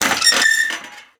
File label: Amb Constructions